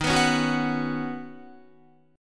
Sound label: Eb Minor 7th Sharp 5th Eb down Guitar; strummed